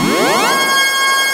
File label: HOE DOWN, SYNTH; Keith Emerson Signature Series